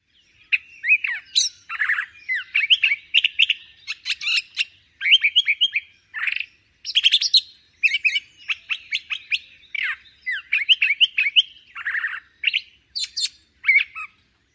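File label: song, Curve-billed Thrasher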